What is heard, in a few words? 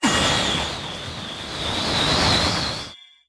Gale Boomerang Throw Short